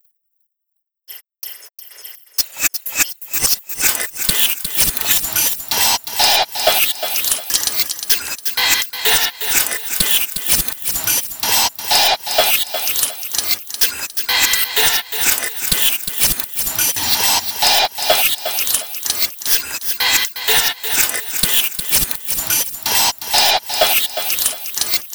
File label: White Noise